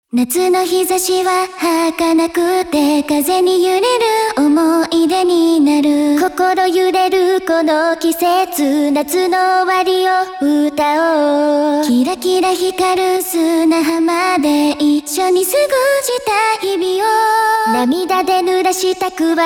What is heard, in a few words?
Mixed Main Bus